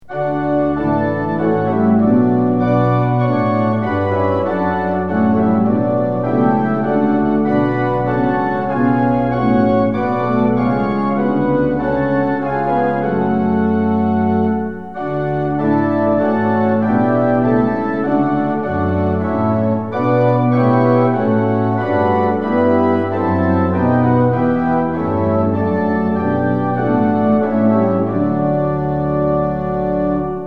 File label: tune; hymns; lillenas